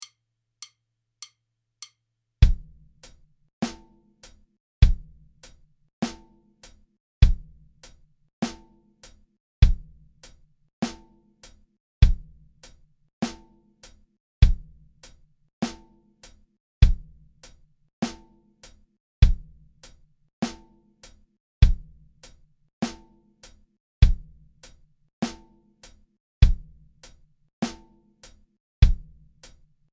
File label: metronome 100 bpm